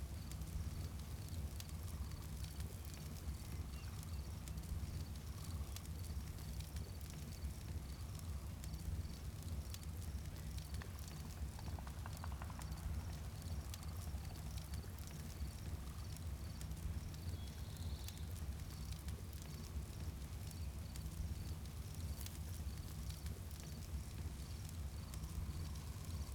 bg cobble night; Counter Strike Model Pack; CS:GO; panorama

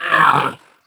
ballbot facepalm; Portal 2

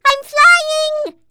turret launched; npc; Portal 2

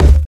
physics cube md; Portal 2

